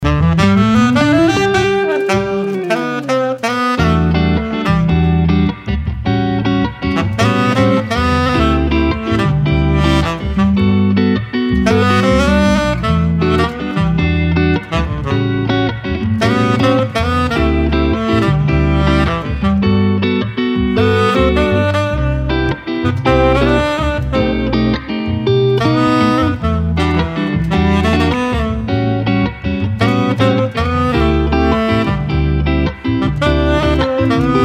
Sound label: Sax Jazz Player; Play Music Swiss